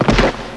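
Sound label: Body fall; Demo Man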